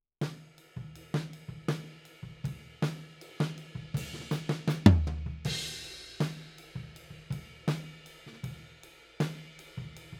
Tom, 160 BPM

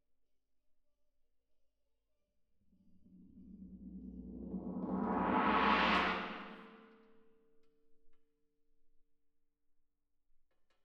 Tam-Tam Main

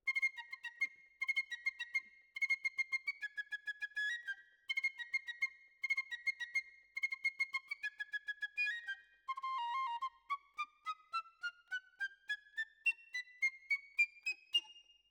Flute

